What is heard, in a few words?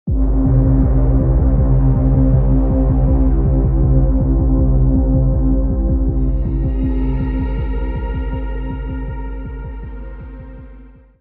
startup, multi-function display interface